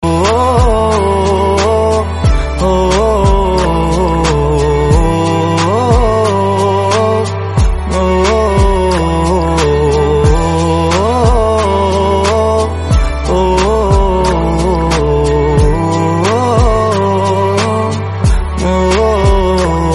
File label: Bgm